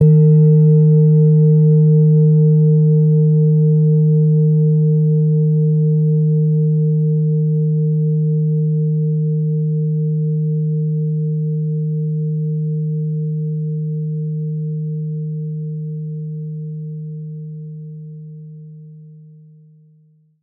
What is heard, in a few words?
Singing Bowl Soft Stick Meditative Hit